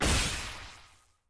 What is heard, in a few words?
aah; lux